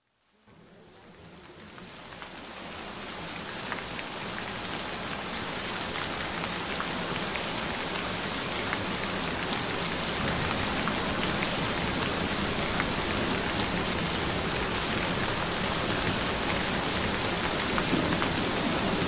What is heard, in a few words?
Relaxing Rain Sound; Beautiful Big Round Youthful Breasts; Cooper's Ligaments Shortening